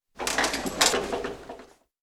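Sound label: turnstile sounds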